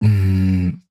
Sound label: Mouth Bass; Aawaz; Gully Loops